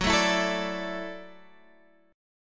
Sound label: E 7th Suspended 2nd Suspended 4th F sharp, guitar